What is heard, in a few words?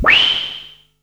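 noisy, synth drum machine; Best Service Alpha Dance vol.2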